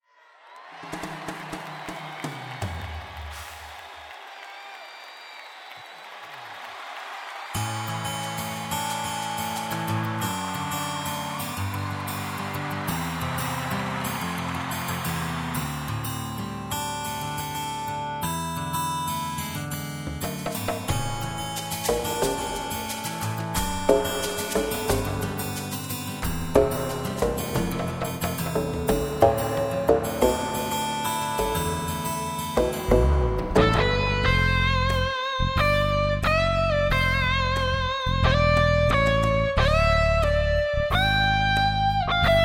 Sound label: Bass; cmedia; Albatron